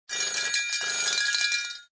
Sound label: Phone; The Wonder Pets Save the Sea Creatures